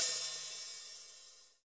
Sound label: HH, Real